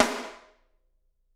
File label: SD, Drums; Bob Clearmountain Drums I